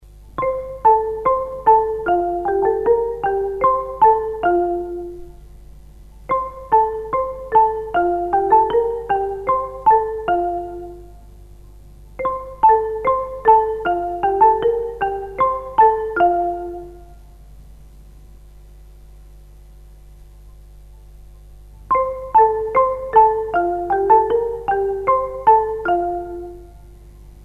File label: xylophone; Oranges and Lemons; BBC World Service